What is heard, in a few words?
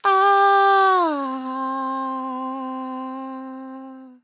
vocal fills 100BPM A; ahhhehh